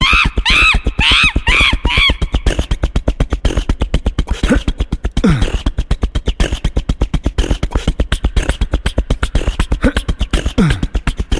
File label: Acapella; tono de llamada; Temple Run Sound Effect